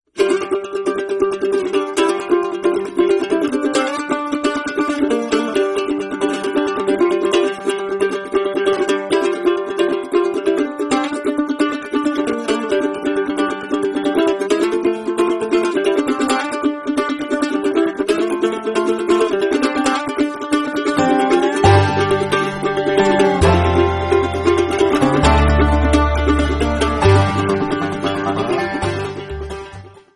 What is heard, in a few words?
bluegrass